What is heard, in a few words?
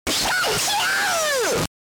Pikachu SFX; RBY